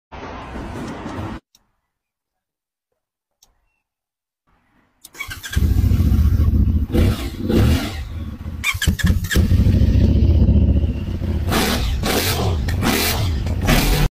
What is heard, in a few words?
cf 250, موتور